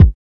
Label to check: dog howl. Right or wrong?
Wrong